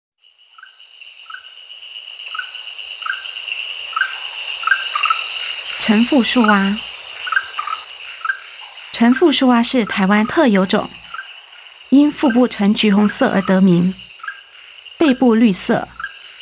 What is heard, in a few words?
Rhacophorus aurantiventris